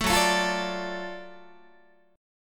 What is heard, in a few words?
Gb Minor Major 7th Sharp 5th, Guitar, chord; strummed; JGuitar